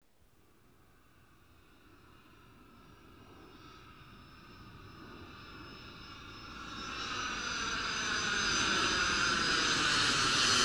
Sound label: Jet Fly By